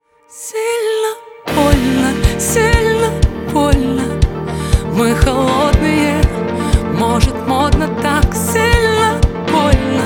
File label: acoustic version